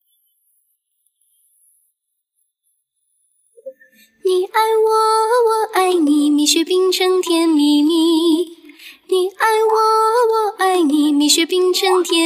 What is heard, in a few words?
vocal